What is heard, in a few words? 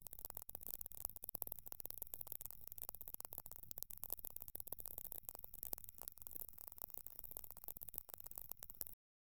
deep resonant mandible cl, insect